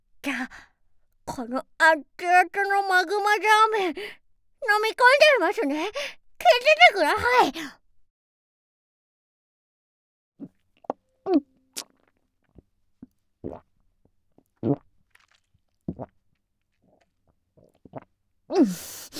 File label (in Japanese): ザーメンゲップ2回！！; ザーメンゲップ集